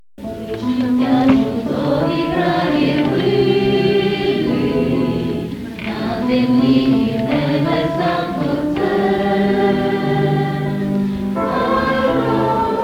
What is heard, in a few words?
ukrainian